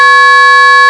Coin Return; Box of Many Colors 3.0

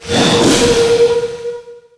shark hit; DAoC

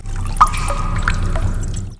DRIP

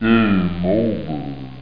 Game Over; Chomp3D

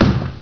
KICK; CD Action

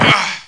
ouch

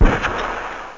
rocket b; castaway

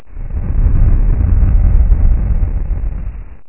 Quake; landslor

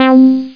synthbass C3; Flashback Demo

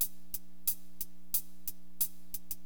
HH LOOP, Hip Hop, Drums; LiveAct Pool 1

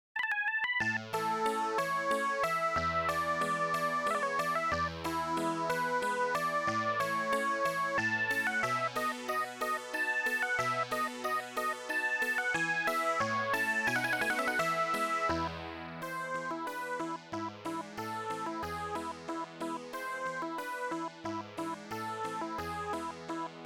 minuet

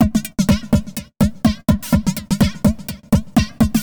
HOUSE 125

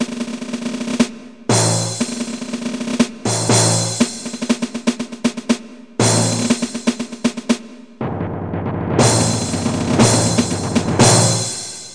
drums

